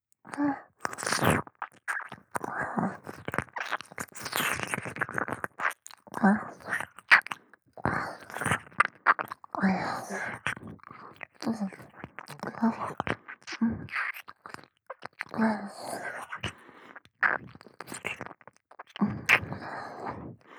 耳舐め・激しい; loop音声

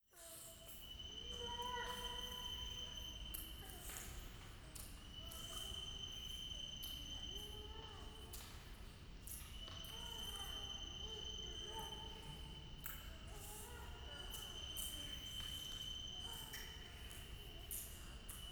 イラマチオ 山奥

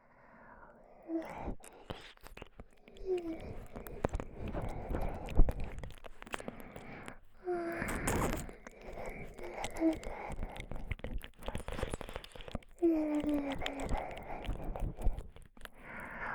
やらかい 舌 で ぺろぺろ ＆ 吸い付き 舐め, ASMR

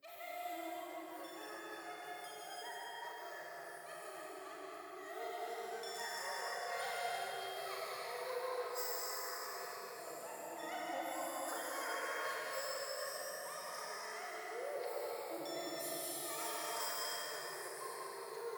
喘ぎ 寺

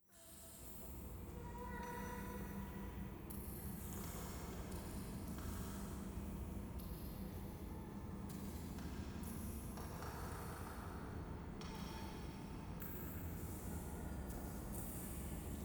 ゴースト イラマチオ 電車

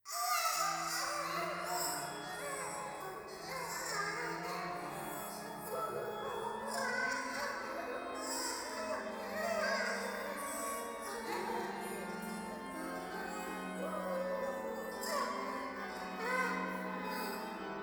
シャブ喘ぎ 讃美歌

